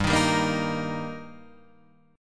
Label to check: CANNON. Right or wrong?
Wrong